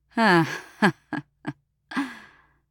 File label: Hunter Laugh; Realm Royale